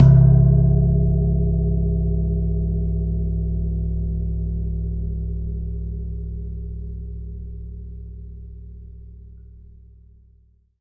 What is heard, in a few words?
Long Gong Single Hit; ETHNIC and WORLD PERCUSSION; Longevity Gong by Amani